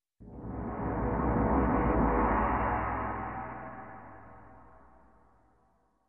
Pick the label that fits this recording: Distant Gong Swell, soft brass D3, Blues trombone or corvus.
Distant Gong Swell